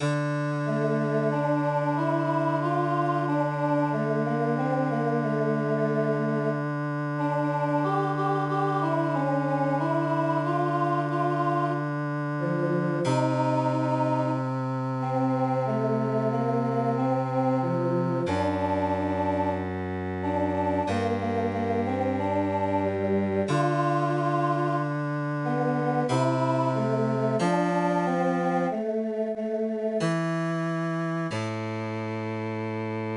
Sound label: MIDI